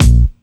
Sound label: bass, 808s